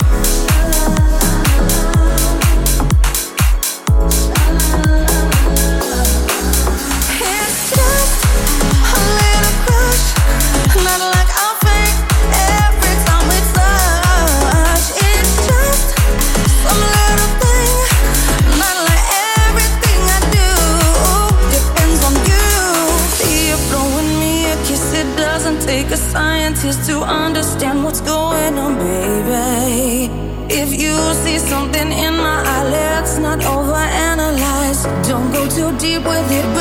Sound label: 124bpm